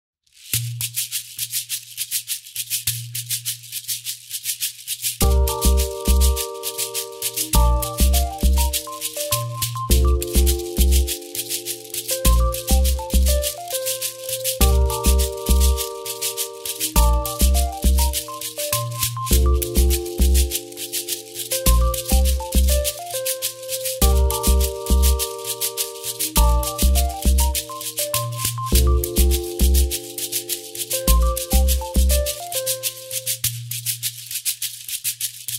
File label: Shekere